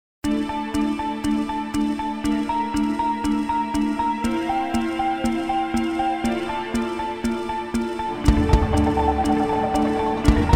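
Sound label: musical countdown, tense, 30 sec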